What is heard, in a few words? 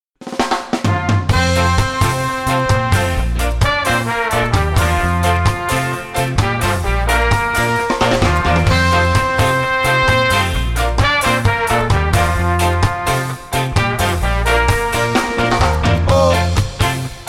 Reggae